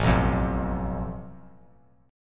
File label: A Suspended 2nd Sharp 5th; strummed; JGuitar